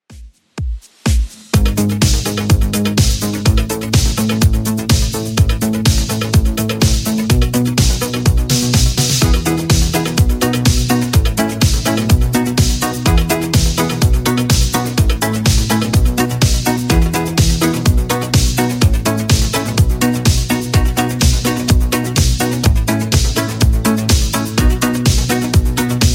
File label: 80s Redrum 125bpm